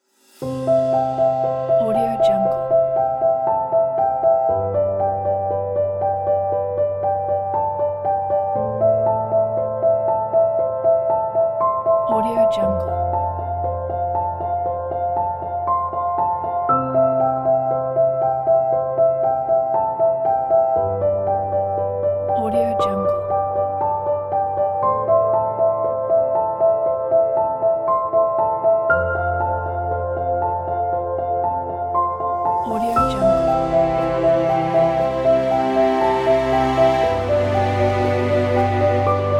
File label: romantic background